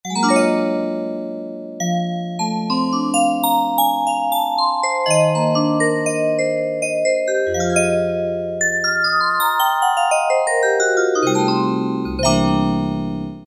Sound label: Kawai K1m factory patch Vybes; Factory patches - I banks